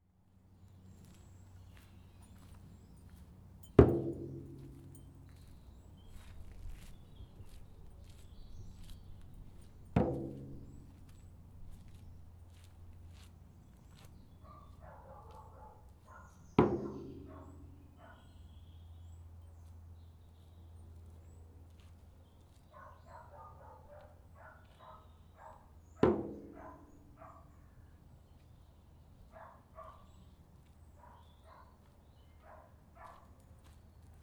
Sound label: Drum Walk; Houghton